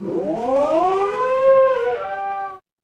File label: mechanical siren